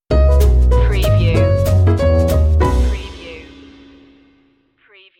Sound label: tip tap stinger